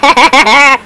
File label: wicked laugh